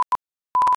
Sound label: keycode panel accept; Looking-Glass